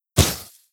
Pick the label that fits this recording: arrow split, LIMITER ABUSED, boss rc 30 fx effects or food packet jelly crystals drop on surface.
arrow split